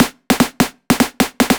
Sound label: percusion snare